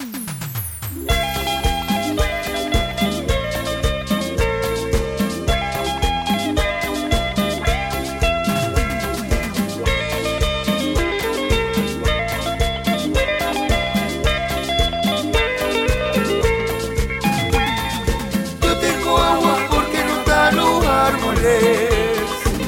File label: jingle; BPAM